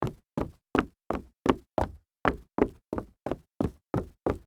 dress shoe jog on wood

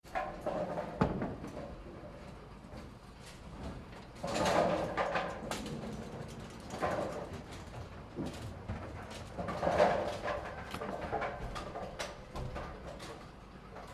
bowling pin machine

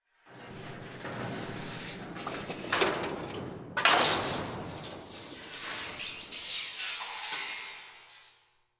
crash ribbon steel big crash

